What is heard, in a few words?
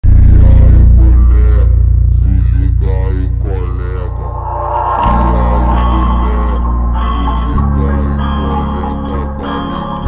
doors light funk